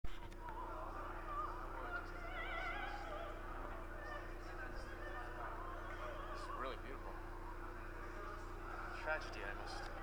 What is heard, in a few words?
AirGuitar